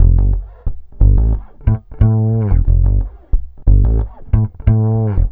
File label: Vintage Fretless, Electric Bass; Sonic Foundry (Sony Creative Software); Leo Cavallo Bass Taster